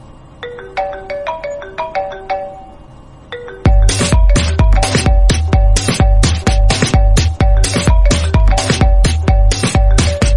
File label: Fast Music iPhone Ringtone; ringtones